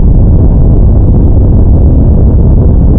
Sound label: move; magnus